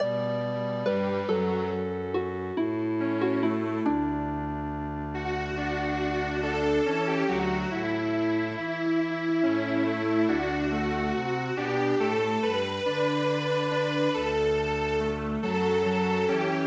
Strings Quartet; midi traditional

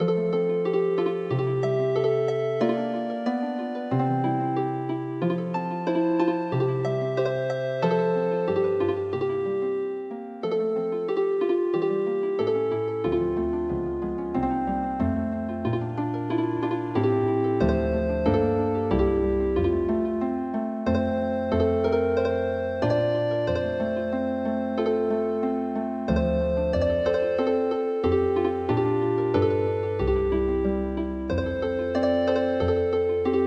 Healing Harp, traditional

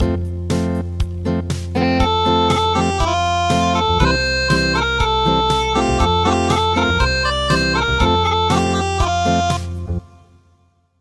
Acoustic; Names of Days

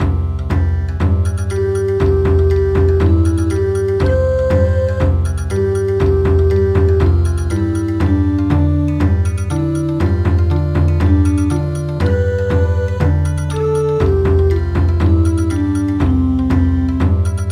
Bon Odori; Clap hands with the ladybugs

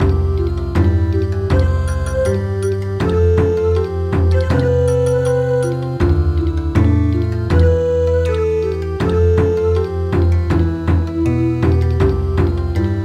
Bon Odori

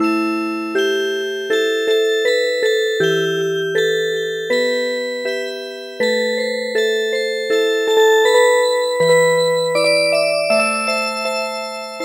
Music Box; Choshi Tairyou Bushi

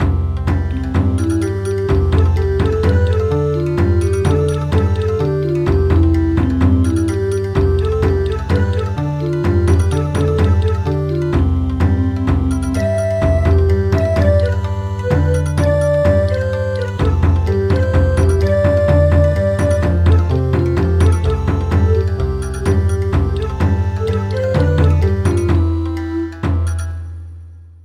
Bon Odori, traditional; Sunday going to mass

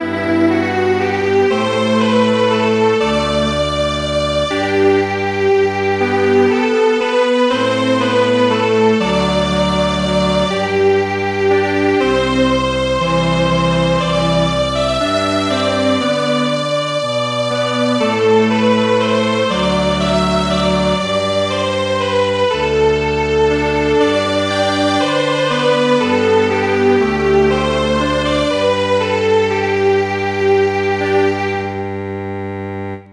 Strings Quartet; traditional; Muran of England

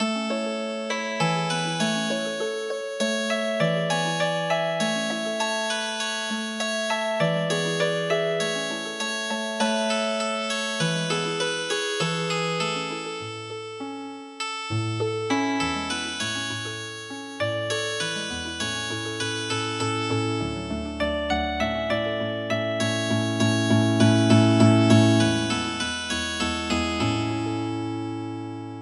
Healing Harp; Aragasu

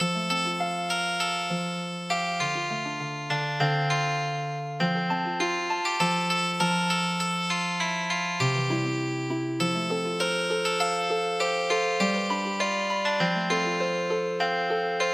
Healing Harp; Carry Me Back To Old Virginny

